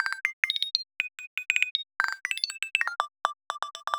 Thumb Nail FM, FM Radical Manipulations; Sonic Foundry; The Electronic Music Manuscript Collection

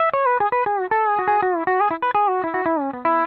PD LOOPS 120, Whole Lotta Country

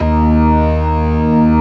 STOPS, organ combos

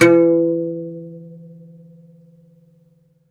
OUD E2, Plucked Strings; E-MU Producer Series Vol. 7 – Old World Instruments

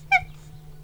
monkey; E-MU Producer Series Vol. 3 – Hollywood Sound Effects; Water

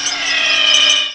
DESCENT; CMI Sound Library Rev 1.3

